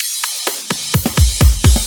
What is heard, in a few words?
Fill Ins 128BPM; Vengeance Samples